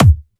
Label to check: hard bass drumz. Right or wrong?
Right